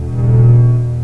hum; XDM; ambience

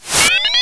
window; XDM

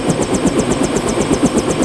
rotor, apache; XDM

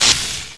spark; XDM; buttons

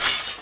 glass, debris; XDM

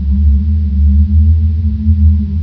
wind; XDM; ambience

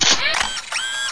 turret deploy short; XDM

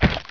flesh, debris; XDM

